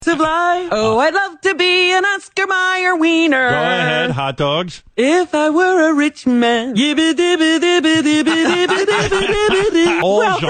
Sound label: Robin Singing